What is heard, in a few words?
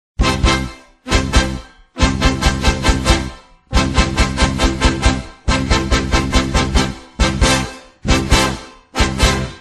frevo; sport clube do recife